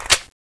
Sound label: m4a1 clip in; DarkKnight